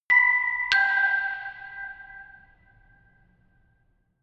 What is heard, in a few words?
notification glockenspiel creepy third down